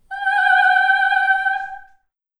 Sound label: female operatic ah opera singer sings ah